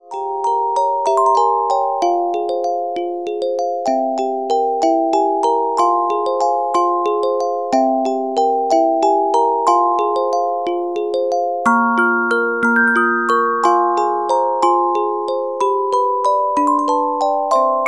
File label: Carillon Music Box Melodies